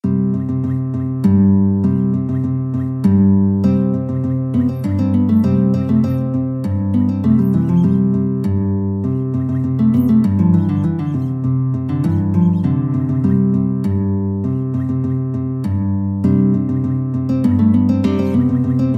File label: bolero, guitar